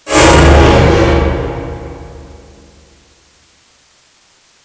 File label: ghost shock